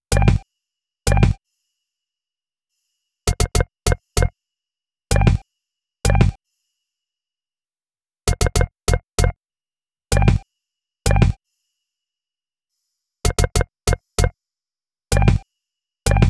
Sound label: Melody